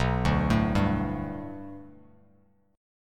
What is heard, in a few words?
B Minor 7th Sharp 5th B Bass Standard, chord; Bm7#5 arpeggiated; JGuitar